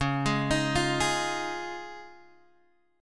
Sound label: Db Major Add 9th, Guitar, Open G